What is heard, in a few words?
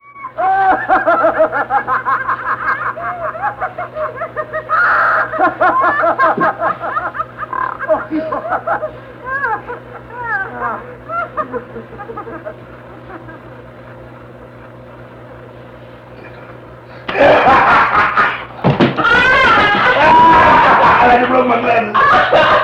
Louis and friends laugh at; Louis Armstrong and comedy